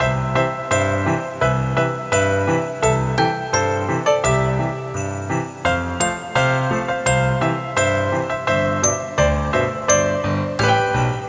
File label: jingle bells; christmas